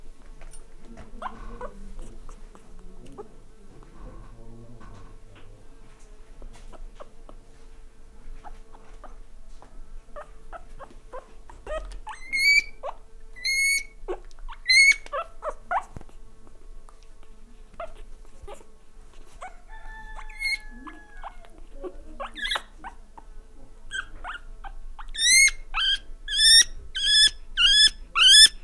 tengerimalac kakas; parasztudvar

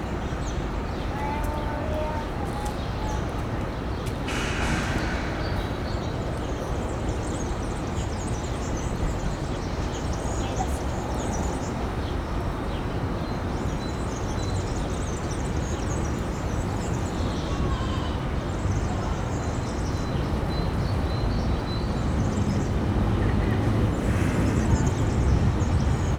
másodszorra nagyon halkan fúj egyet, nílusi víziló; debreceni zoo 2012

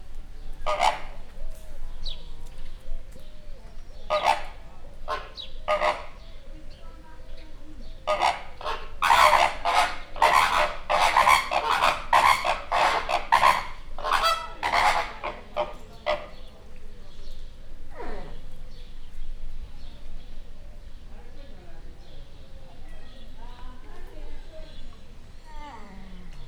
párbeszéd, rózsás flamingó; Győri Zoo 2014

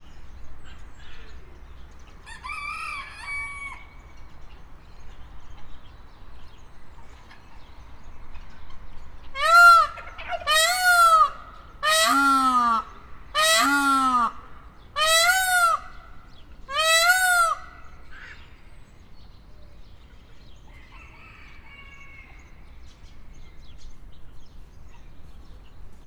pava; állathangok; nyíregyházi zoo 2010

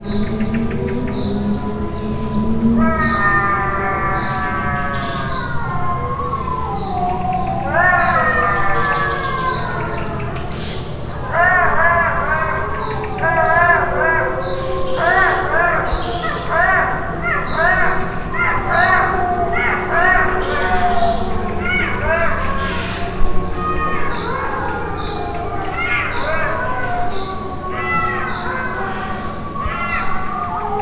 távolban vonyítanak, szürke farkas; szegedi zoo 2011

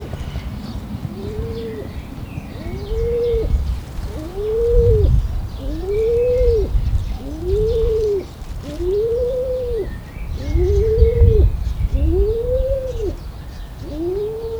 bug szello varju is, debreceni pergo galamb; debreceni zoo 2012; marantz puska

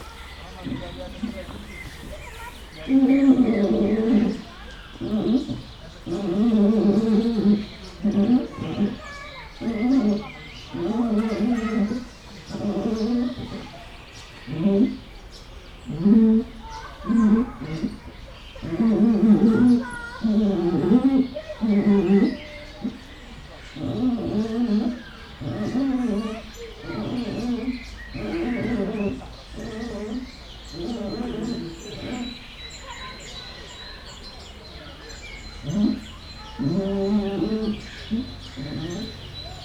orrát a plexihez nyomva húzgálja, kissé távolabbról, fehérkezű gibbon; jaszberenyi zoo 2016

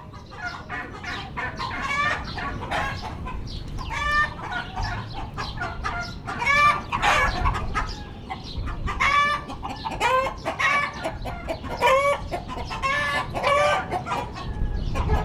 hangoskodó szárnyasok bronzpulyka karalotyúkok szeles idő, baromfiudvar; Hortobágyi Nemzeti Park 2013

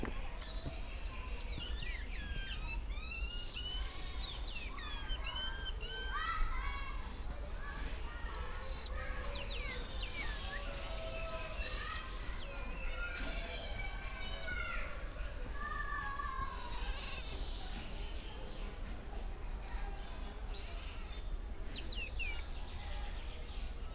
szurikáta; szegedi zoo 2011

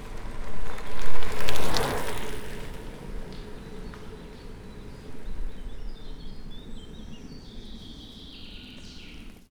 lefele egyedul, kerekpar tura; visegrad

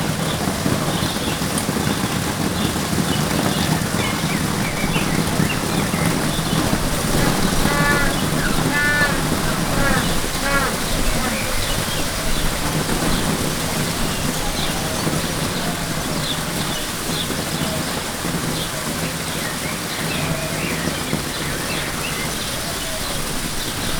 pingvinek szóló környezethangjai, pápaszemes pingvin; jaszberenyi zoo 2015

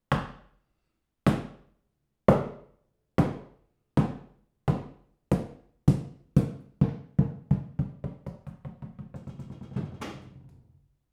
pattogas, tomor gumilabda; perkupa

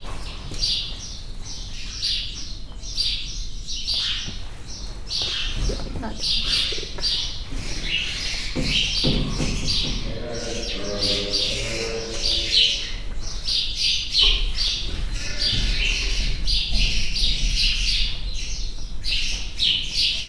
istálló neszek rózsás gödény, házi veréb; Hortobágyi Nemzeti Park 2013

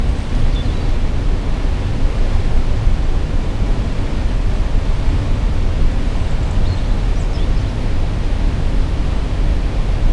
kurtobe omlo viz madar

